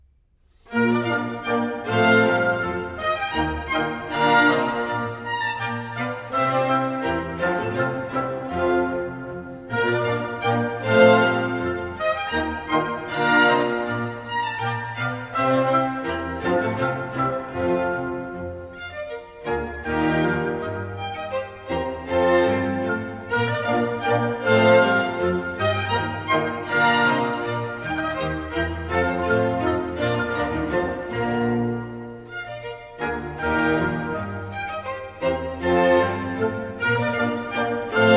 Cassation in B flat major - Menuett; Mozart; Serenades-Divertimenti-Dances

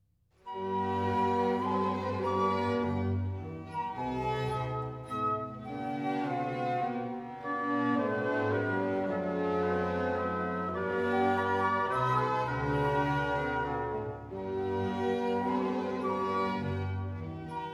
Symphony, Andante grazioso; Symphony in D major, KV 111a; Mozart; Symphonies

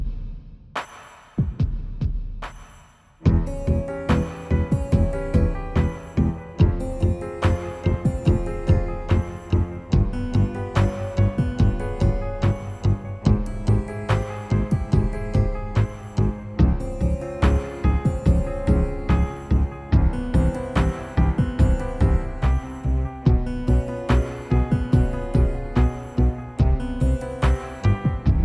Double Harmonic Major Progression

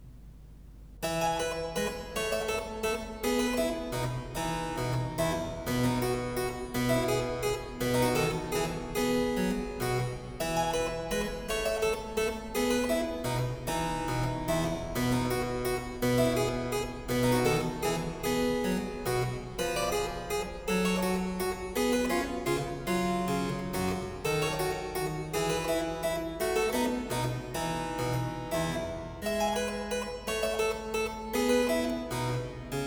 Menuet in F major, Keyboard Works; Mozart

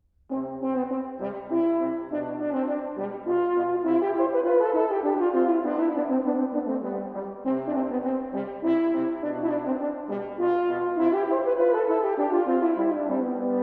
Duos for two horns - Polonaise; Mozart; Serenades-Divertimenti-Dances

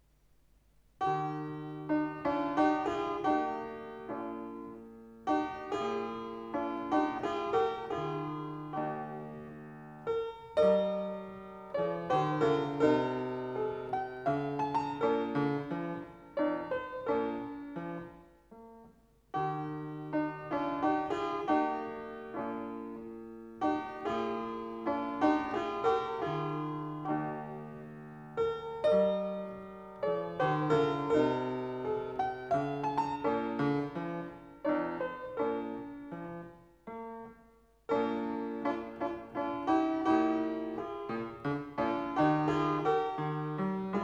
Andantino in E flat major, Keyboard Works; Mozart

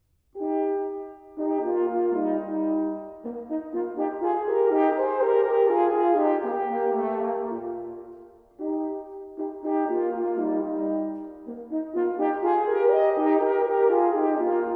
Duos for two horns Larghetto; Mozart; Serenades-Divertimenti-Dances

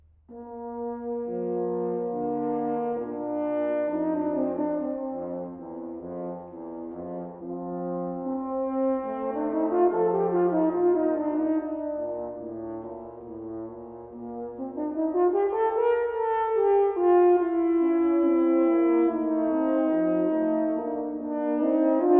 Duos for two horns - Adagio; Mozart; Serenades-Divertimenti-Dances

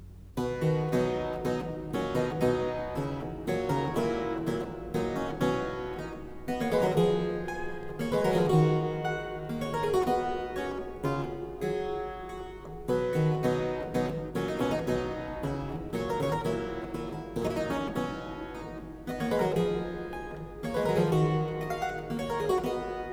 Menuet in C major; Mozart; Keyboard Works

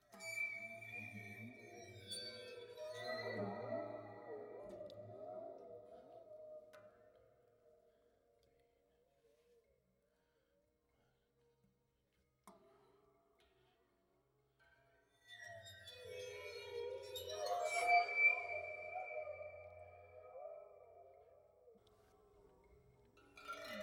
Waterphone

